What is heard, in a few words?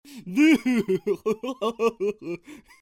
turd laugh; Huhahahaha!; Stoner turd